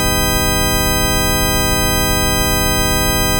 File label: multitone flat; linearaudio